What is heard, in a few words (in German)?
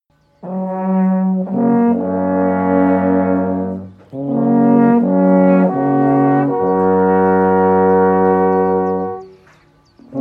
alphornklaenge; bifang